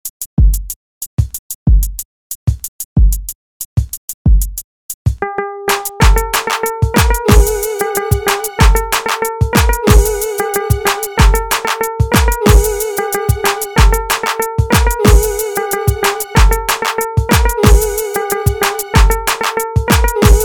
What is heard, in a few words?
beat